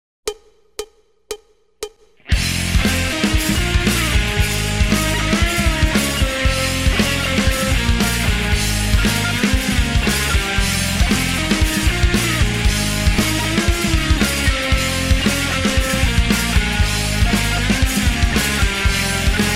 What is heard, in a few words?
Solo Interlude 3rd Verse Full Band